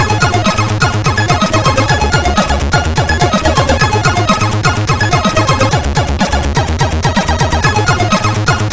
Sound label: Fast, MOS6581R4